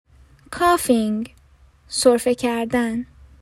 Coughing

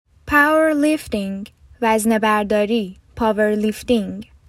Powerlifting

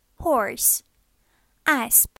Horse

